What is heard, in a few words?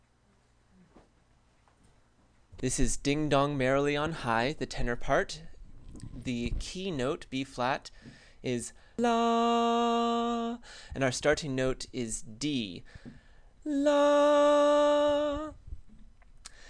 dingdong; Ding Dong Merrily on High